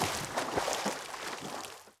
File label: Animation Water Drowns; Narita Boy